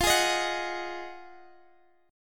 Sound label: F Minor 7th Flat 9th